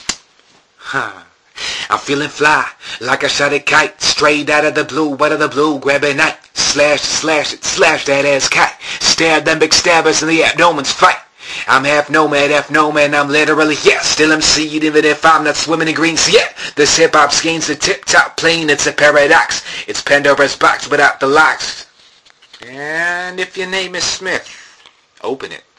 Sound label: Sunday Chimes